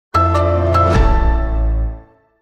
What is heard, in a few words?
Sonic Logo; AMEX